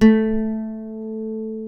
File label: A2 FLAMENCO, GTR; AKAI S6000; WorldSounds